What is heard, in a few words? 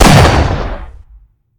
shotgun; hl